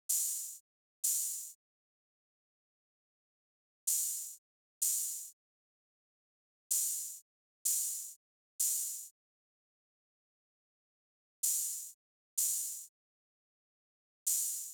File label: Drum Loop - 127 BPM Open Hat; Loop Stems; Cymatics; DOPE DRUMS VOL. 1; Grand Slam Drum Loop